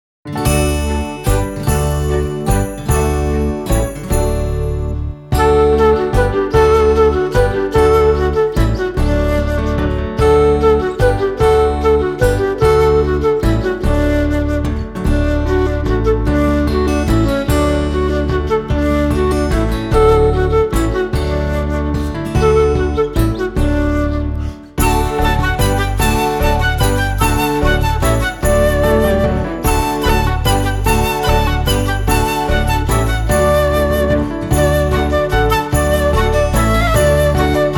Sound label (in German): Instrumental, Kommet ihr Hirten